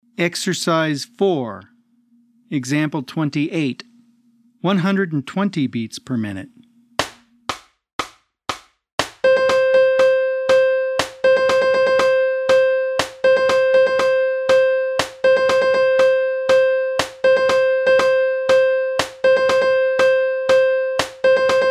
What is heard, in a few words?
120 BPM